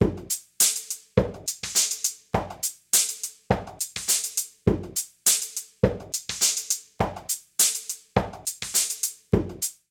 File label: Waldorf 2 Pole Drums HP Filtering